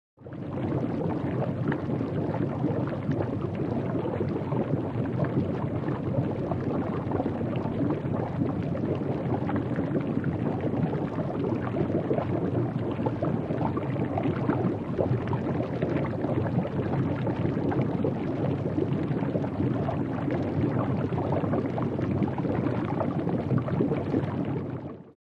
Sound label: Bubbles Jacuzzi Caul